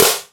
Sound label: dirty acoustic snare g key, snare one shot tuned to the G note